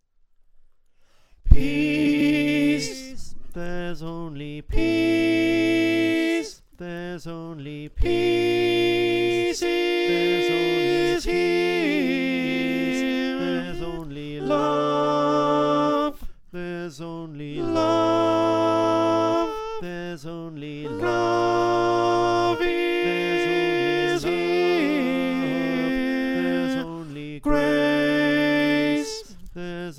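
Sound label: Alto, choir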